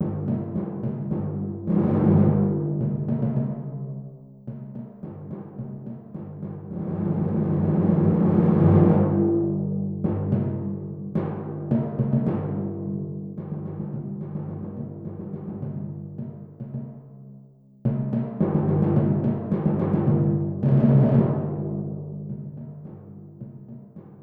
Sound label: Timpani; Band Audition